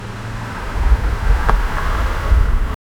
realistic sound of a car; driving

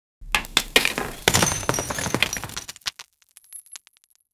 create a sounds of a, man made

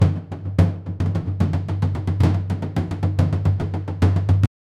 the sound of drums in, african

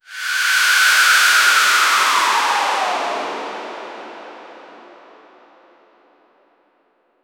swoosh

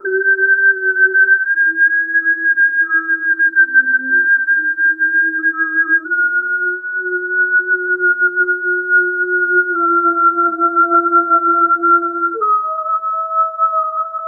mystical mouth whistle

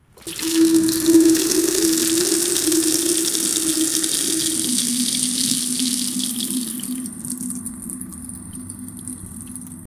generate realistic sound, sink

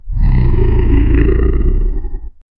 deep guttural demon laugh

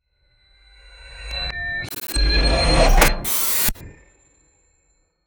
cyberpunk barrier hologra

